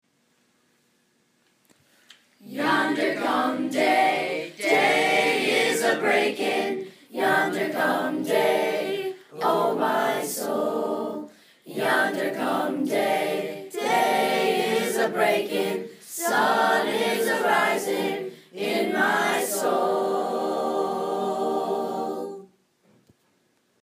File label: boys ensemble